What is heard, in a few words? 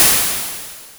cymbal